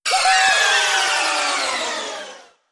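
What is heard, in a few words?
wheel start additional effect; Fruit Fiesta; Wazdan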